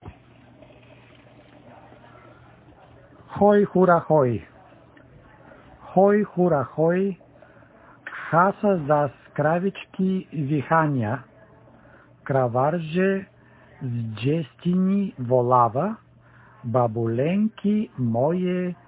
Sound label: ssaa